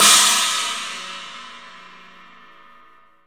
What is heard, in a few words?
Percussion, Room; Giga Samples Collection